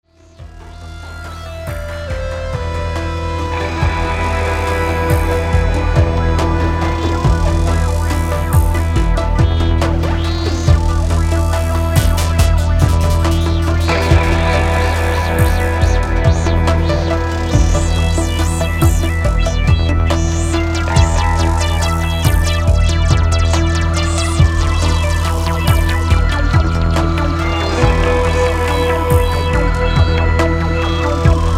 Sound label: Electronic Meditation; Headshock; Paul Nagle; Tim Rafferty